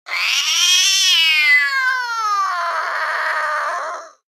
2 syllable two drawn out threatening growls 2016Hz; sylvester the cat